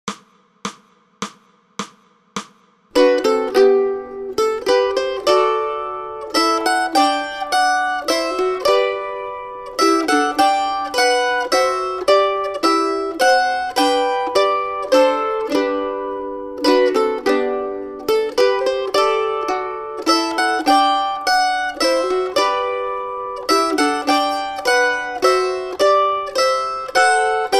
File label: Mandolin; First Noel